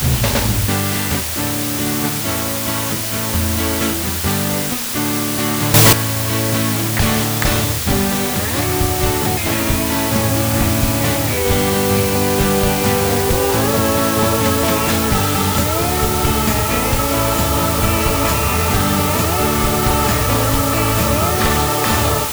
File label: Triangular, dithering